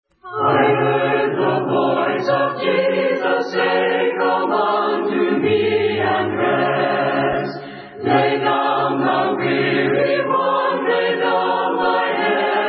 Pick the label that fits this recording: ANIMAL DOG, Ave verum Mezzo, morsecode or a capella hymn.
a capella hymn